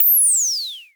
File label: Dual-Percussion-Synthesiser; Klone